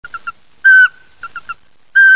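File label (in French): Chouette Chevechette, Rapaces; strigides; La Chouette chevèchette; Oisellerie Galan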